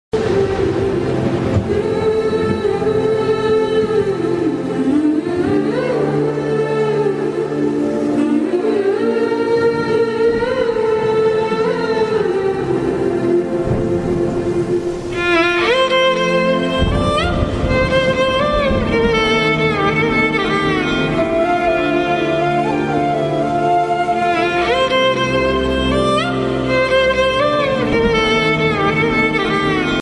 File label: climax emotional sad bgm ringtone telugu bgm ringtones; uppena movie bgm ringtones